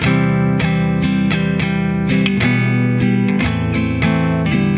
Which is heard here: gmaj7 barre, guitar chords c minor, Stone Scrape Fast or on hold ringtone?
guitar chords c minor